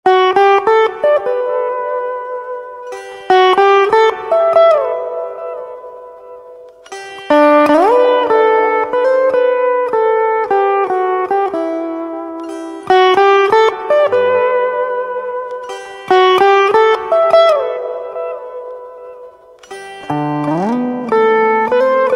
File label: Veena, instrumental ringtones